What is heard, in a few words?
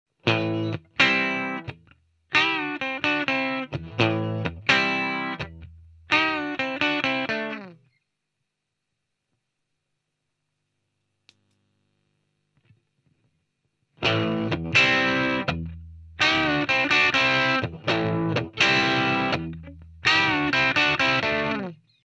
distortion